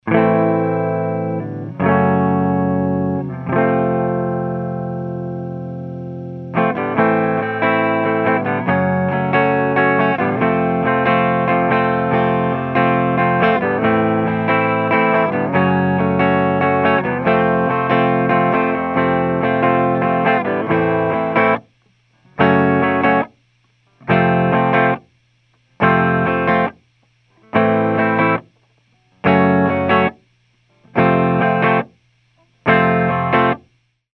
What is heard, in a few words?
AMP Clean, FET Condenser Audio-Technica AT4050